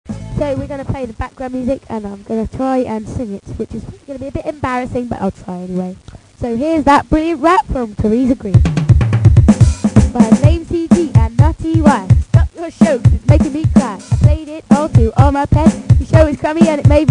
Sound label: Rap